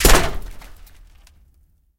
bullet hit outside; War Thunder

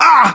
pain, english

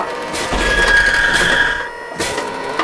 terracotta front loop

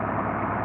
Technic Engine; DCS

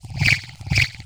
hacking interface seek

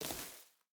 footstep rubber boots grass walk; Rust

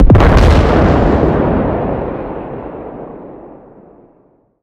damage hits explosions, Warships

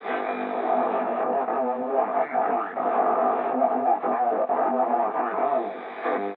ambient battle; World Of Warships

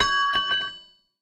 cannon shell drop

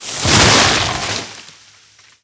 treefall down; World of Tanks Blitz

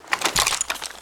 reload lift, foley; styaug

